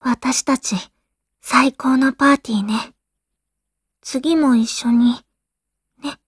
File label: cheer, voice commands; svencoop; Blanc